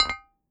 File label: Pots